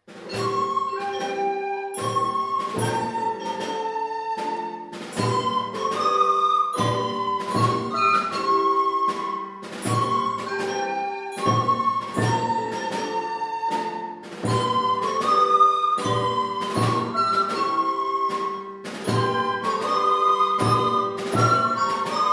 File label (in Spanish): Gaudeamu sin Coro